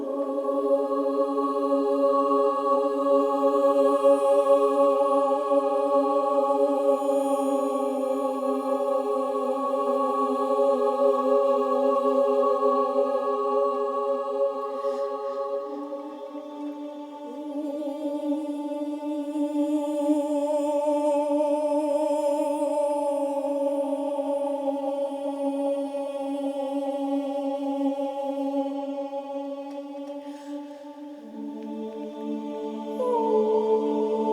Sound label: Caves Amb Pads, Music; Duviri